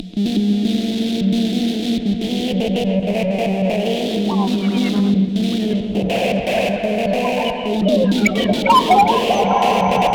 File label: synth noise